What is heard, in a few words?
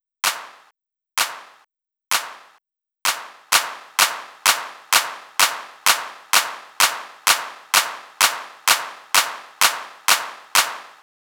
Clap, Drum Loop 128BPM; VEC5 Drumloops; VEC5 Multi Loops 128BPM; Loop Elements